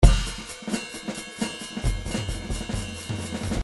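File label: drums jazz pattern; Paul Kodish Dangerous Drums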